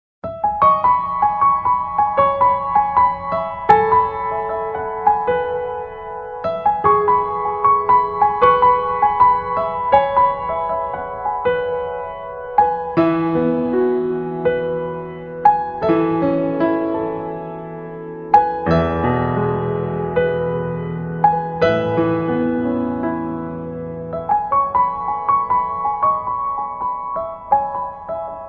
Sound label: Piano; IPL T20 Special